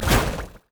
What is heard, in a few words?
inferno bomb; LoL; ziggs poolparty